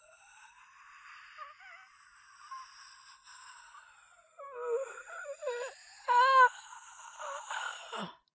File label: groan